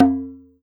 TUBANO; E-MU Producer Series Vol. 7 – Old World Instruments

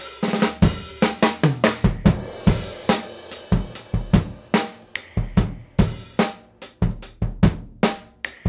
LOOP, Groove Activator; AMG Gota Yashiki

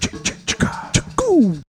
HOT VOX, Roots Disco; USB Soundscan vol.32; AKAI

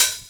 hihat one shot 909

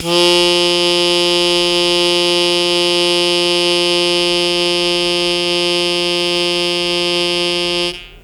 Long Sustain F0, Harmonica; Zero G - Harmonica

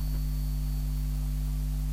Misc Noise

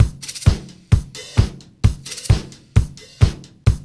BEAT2 R; USB Soundscan vol.32; Roots Disco; AKAI

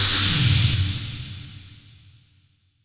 SPACE-FX

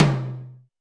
Tom Hi Ring, Alesis D4

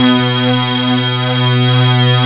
gemsynth; labrat; jazz jackrabbit 2

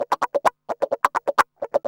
GUI, LICKS; 130 LICKS

